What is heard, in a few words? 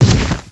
hu body fall; svencoop